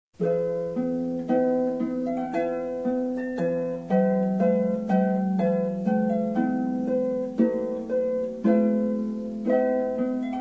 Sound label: trumpet voluntary; clarke